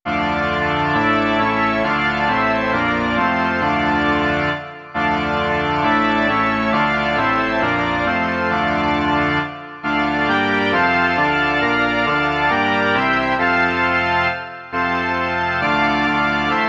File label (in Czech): varhany